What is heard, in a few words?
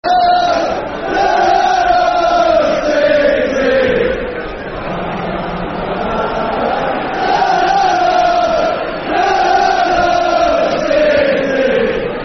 La, La, La Lalalaaaa.... City, Football Chants (Soccer Songs), Cardiff City; fanchants-com